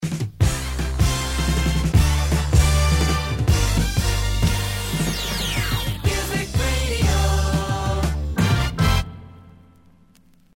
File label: Jingle